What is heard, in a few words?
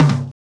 TOM